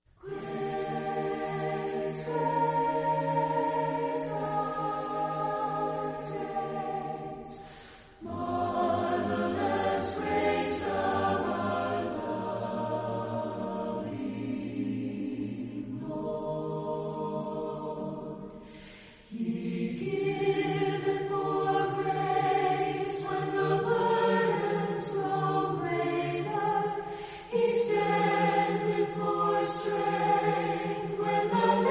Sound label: Chorale, Voices in Praise acappella